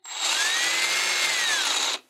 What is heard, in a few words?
drill revving